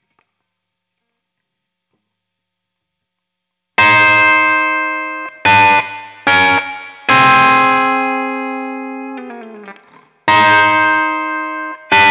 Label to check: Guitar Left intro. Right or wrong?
Right